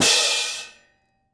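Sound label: cymbal, Orchestral; ILIO; Synclavier Percussion 2; World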